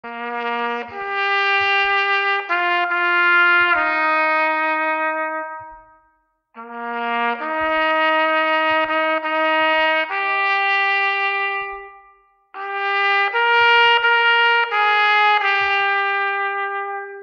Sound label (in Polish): Trąbka pieśń pogrzebowa